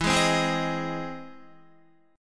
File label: E Augmented Major 7th, Guitar; 11,11,12,13,12,11; strummed; 4F,4A,5C,5G,5C,6E